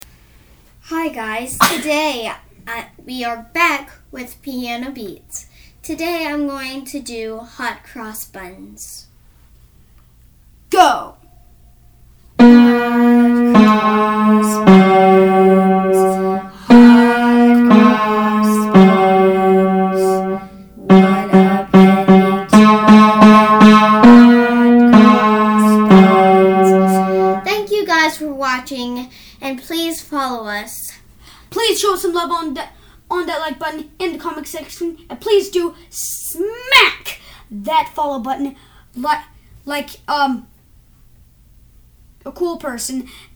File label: piano beats